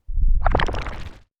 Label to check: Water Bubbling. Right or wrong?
Right